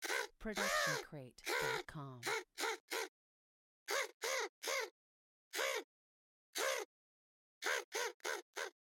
miscellaneous crow noises; soundscrate

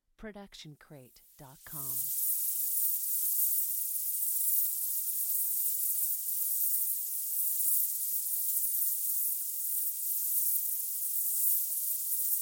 Magic Portal; SoundsCrate

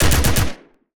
Automatic Weapon Fire

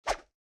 arrow swoosh

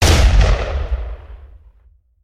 rifle; soundscrate

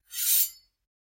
medium sword sheath; soundscrate

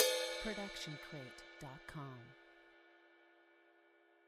ride cymbal 20 inch bell; soundscrate

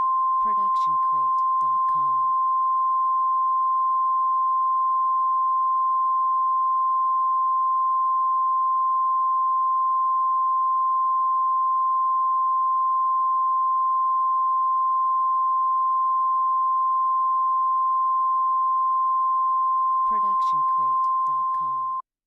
censor beep long

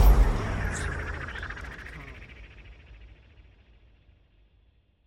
sci fi impacts

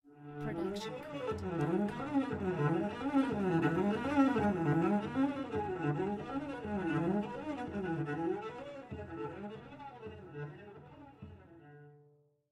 Cello Whole Tone Runs; SoundsCrate

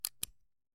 Cowboy Revolver Cocking; SoundsCrate

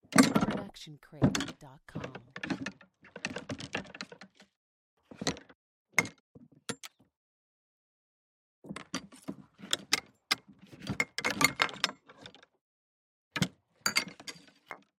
golf clubs in and out of bag mono; soundscrate

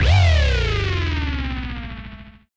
Bass Drop; SoundsCrate

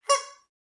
Squeaky Toy; SoundsCrate

